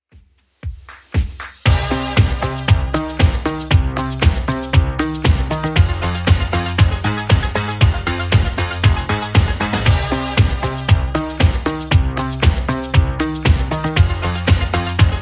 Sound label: 80s Redrum 117 bpm CLEAN